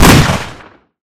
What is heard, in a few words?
six shooter fire